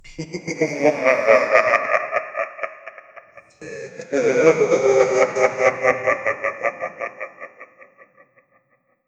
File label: evil laughing, villain; evil laughing occupier